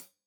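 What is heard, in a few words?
hat closed, hi-hat cymbals stereo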